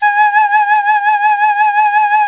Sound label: BANDI G#5, BAN-DI FLUTE; Spectrasonics; Heart of Asia